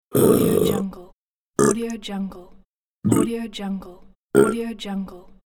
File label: burping